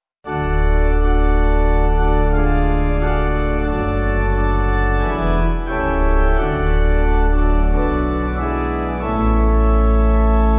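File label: Pipe, Pipe Organ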